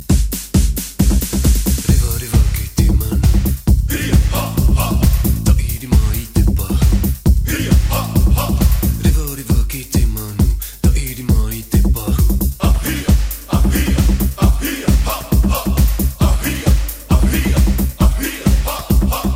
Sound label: Techno Tiki Dance